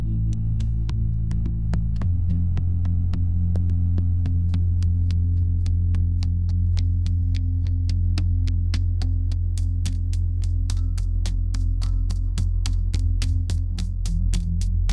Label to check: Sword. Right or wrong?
Wrong